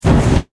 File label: Flame Thrower Burst Short; Warfare